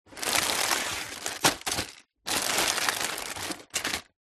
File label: food potato chips packets movement in card box